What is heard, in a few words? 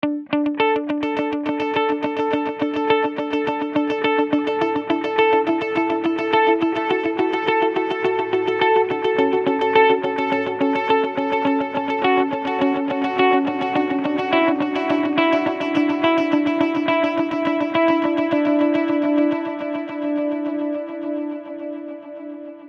guitar bridge